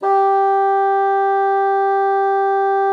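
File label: bassoon mezzo G4; Xsample VOL-6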